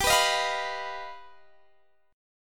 G Augmented 7th, Guitar DADGAD

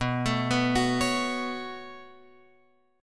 B 7th Suspended 2nd, Guitar, Open F; B7sus2; arpeggiated

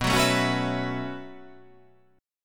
B 9th Suspended 4th B down

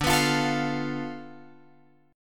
D Minor 6th Guitar Open F; Dm6 strummed; JGuitar

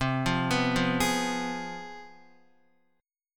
C Major 7th Suspended 4th Sharp 5th, down, Guitar; CM7sus4#5 arpeggiated; JGuitar

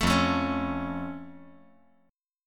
F Augmented F

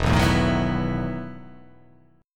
E Major 7th Suspended 2nd Suspended 4th

